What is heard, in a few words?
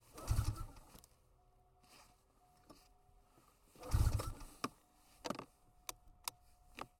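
arctic cat m 8000 onboard start try fail engine intake; sonniss gdc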